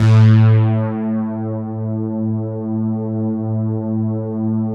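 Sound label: A2 PAD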